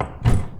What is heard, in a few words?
Furniture